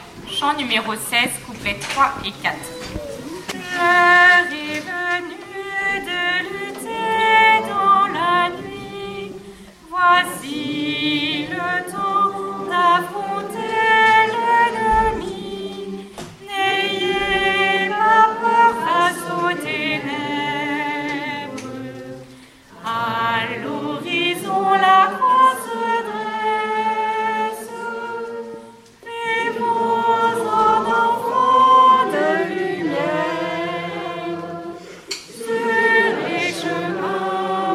chant sortie

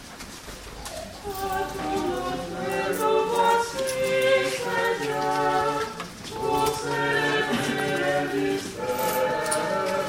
chant offertoire

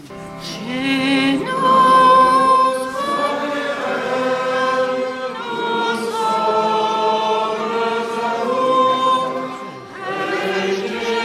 chant sortie

